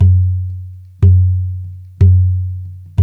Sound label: CONGO, Ethno Drums, Low Drums; Spectrasonics - Supreme Beats - World Dance